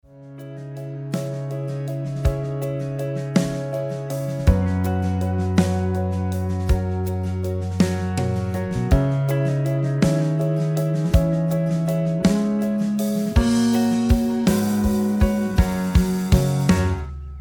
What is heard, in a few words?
rhythm